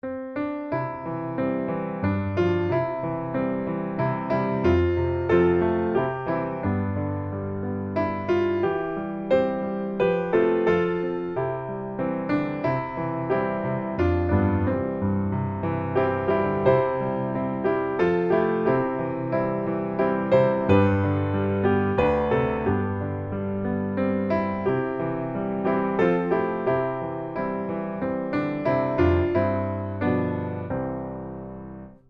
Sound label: piano